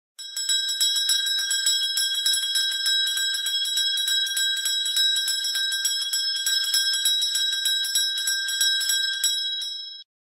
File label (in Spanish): CAMPANILLAS efecto de sonido